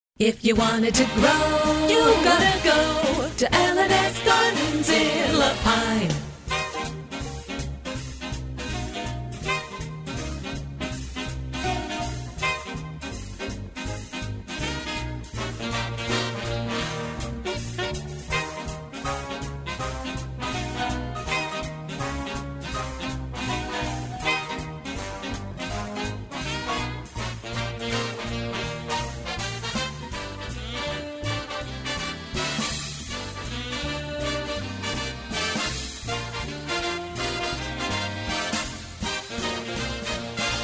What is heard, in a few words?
Jingle